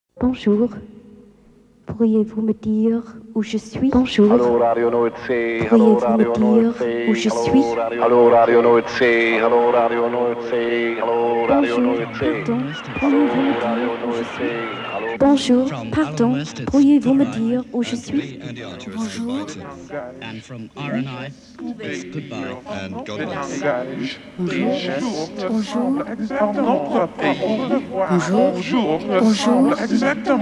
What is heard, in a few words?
Radio Nova Jingle; Nova 25 ans - 1985